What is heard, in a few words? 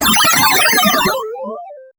pixel healing sound effect; ui sounds